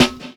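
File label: snare drum 70s; E-Lab; X Static Goldmine 2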